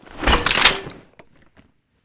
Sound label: reload shut, weapons